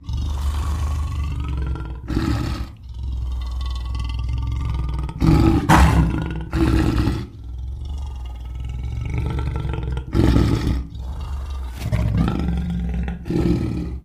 Lion Growl Massive; big cats

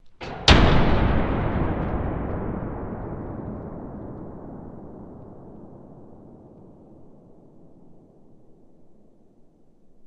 Door Big Closing

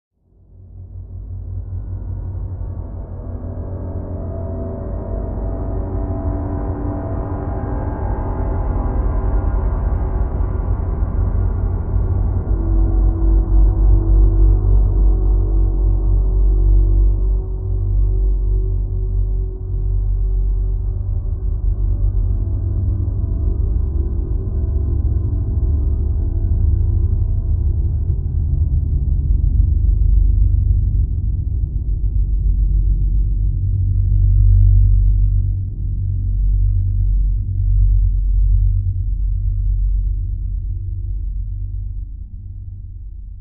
Bed Extraterrestria

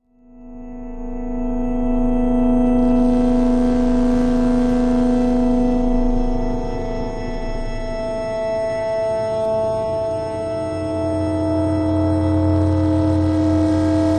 On Edge Elect Tension, energy beams

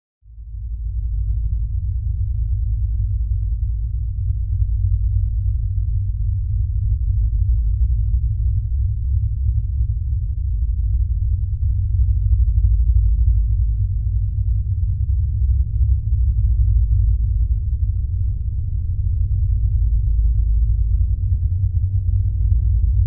LFE Electronic Rumble with Heavy LFE

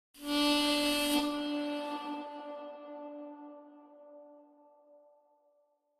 Mouth Organ Steam, harmonica; woodwinds

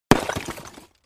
Clay Chimney Wall Hit, concrete & brick

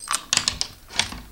Keys Door Lock Small

